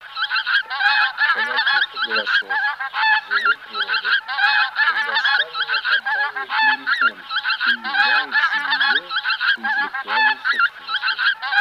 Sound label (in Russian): Гуменник короткоклювый базар Oche zampe rosa